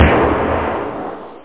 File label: DZING, SNARE; Workbench Add-On - Volume 1